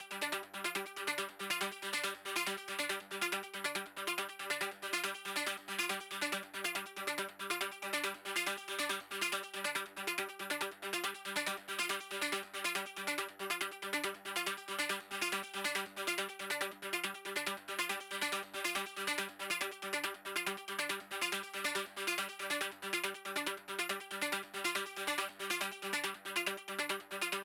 Drive Dry

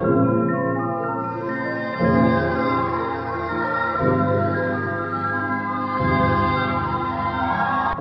MARCH 120BPM

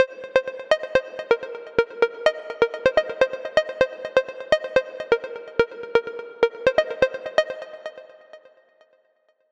Melody Loop C

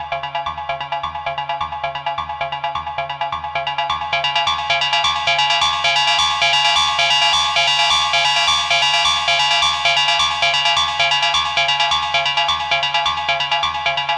Keys 131 Gm

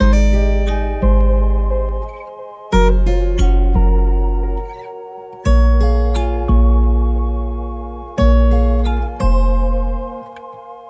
Song Starter 88 G-m

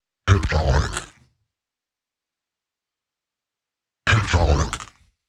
Vocal Loop 126bpm, HYPNOTIC TECHNO; Zenhiser

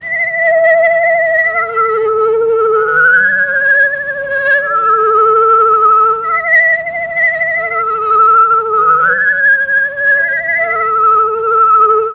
chorus melody loop 79 BPM D

